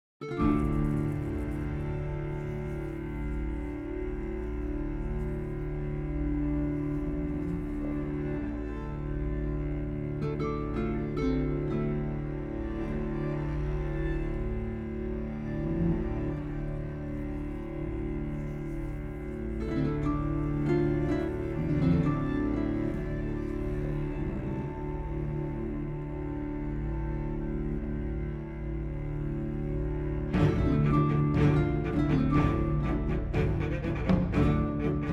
The Winding Path Of Adventure Underscore, Classical Cinematic